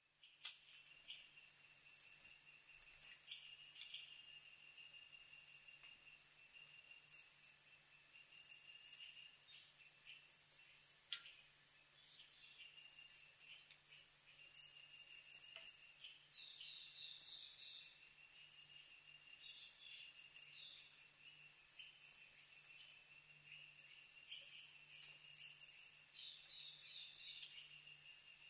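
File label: birdsong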